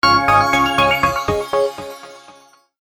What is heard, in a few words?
Power Off; Samsung SHV-E400S